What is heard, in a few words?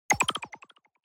Onoma Surprise Up